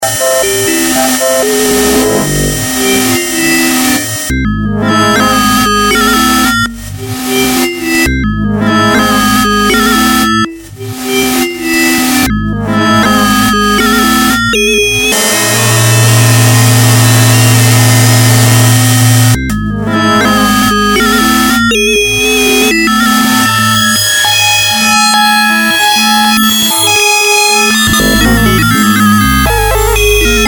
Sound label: PSR-12, Circuit Bent